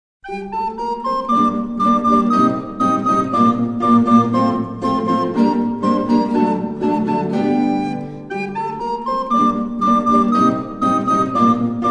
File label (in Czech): zobcová flétna, kytarový orchestr; G. Zanetti - La Mantonava